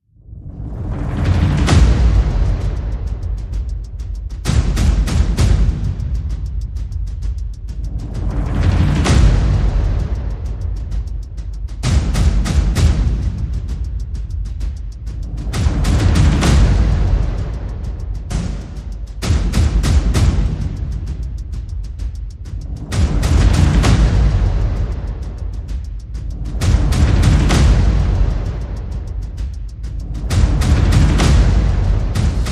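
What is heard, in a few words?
Percussion and FX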